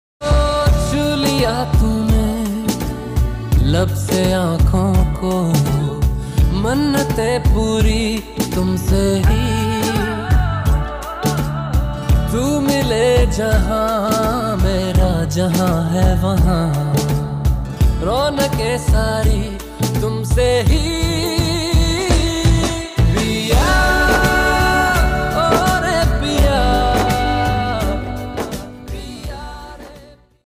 new hindi tiktok music ringtone

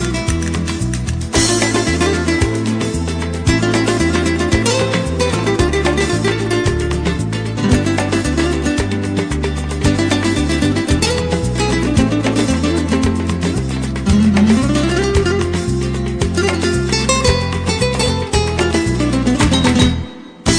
guitar ringtones

